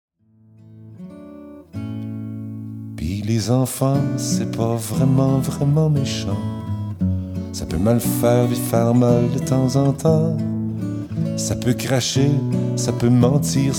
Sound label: guitar voix